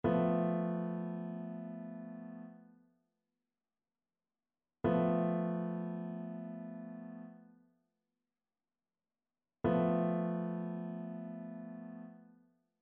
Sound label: trichord; trichord identification